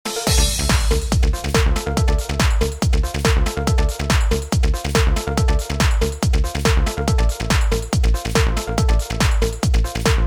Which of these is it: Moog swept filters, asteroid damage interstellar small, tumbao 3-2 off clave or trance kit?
trance kit